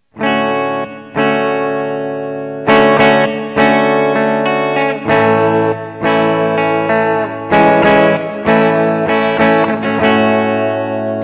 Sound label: reverb